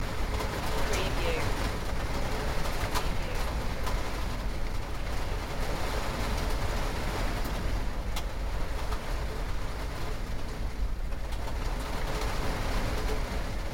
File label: Heavy rain loop; beanstalk audio